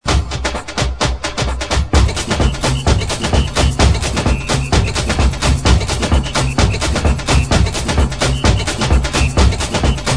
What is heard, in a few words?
freaky saxy